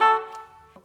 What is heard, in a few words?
Key Basoon; luxembourg zwee